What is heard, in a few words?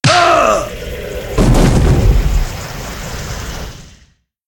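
boss death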